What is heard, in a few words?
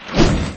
wave